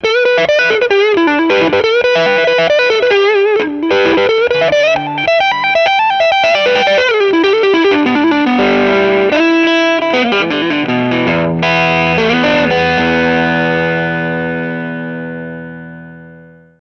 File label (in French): Guitare electrique Les Paul